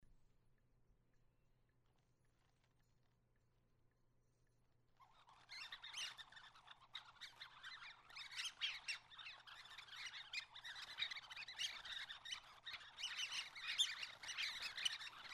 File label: nightingale